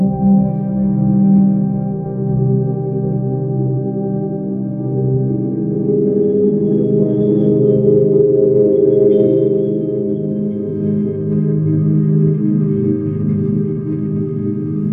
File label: ambient soundscape; ai